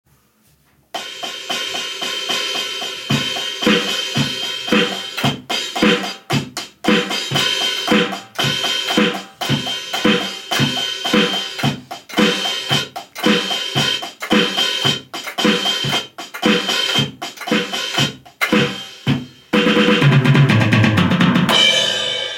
live drum kit recording

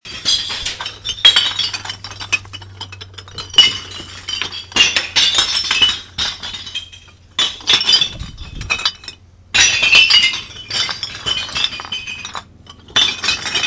recycling bottles

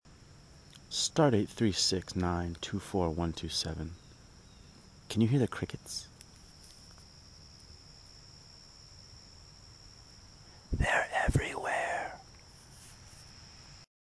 crickets

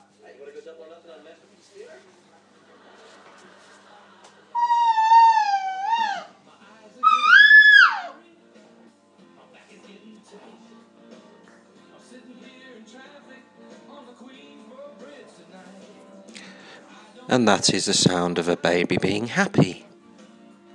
happy baby

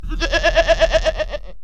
baaaaahhh

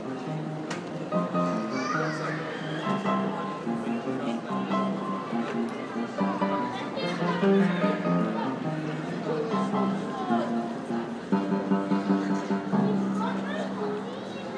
little boys play the piano

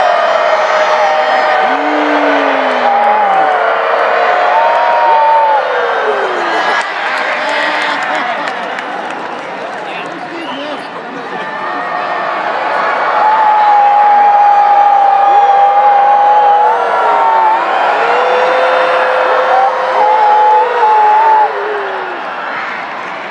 free throw annoying as hell; timberwolves

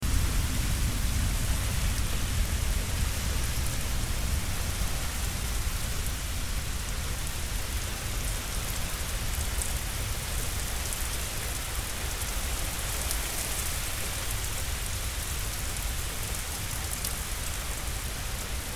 thunderstorm

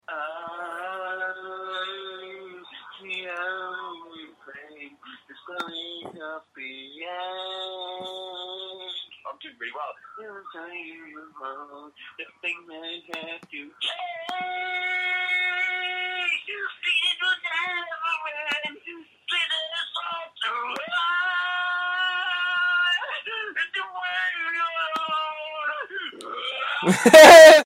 singing

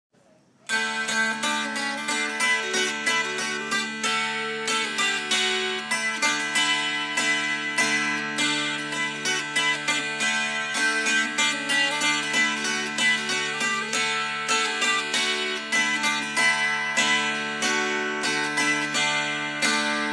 dulcimer

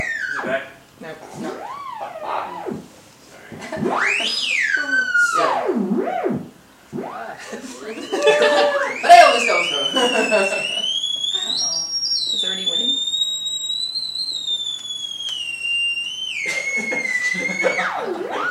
playing a video game with a theramin

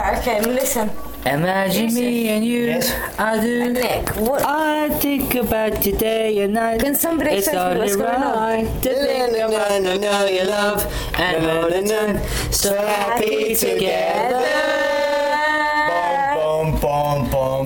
shhh

